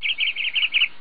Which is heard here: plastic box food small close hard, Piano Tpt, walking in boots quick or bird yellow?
bird yellow